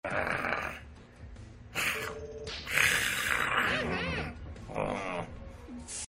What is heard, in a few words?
rawr